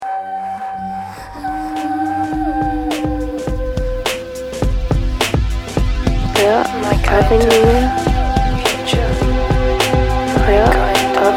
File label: rap song; weeklybeats 2012